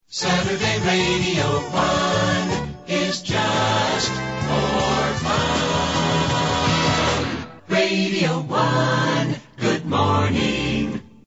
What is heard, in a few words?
jingles just fun